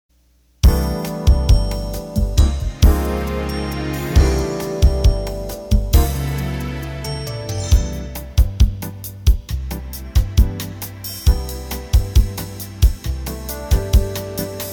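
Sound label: Bossa Nova Med, Roland accordion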